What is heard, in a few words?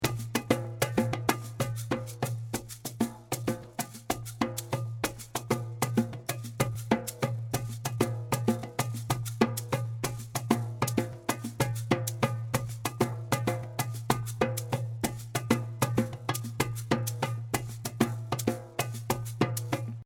Bonse Aba Accompaniment